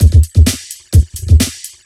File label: ELECTRO; USB Soundscan vol.25; Big Beat Drumloops; AKAI